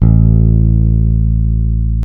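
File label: Bass Electric Full A1; CMI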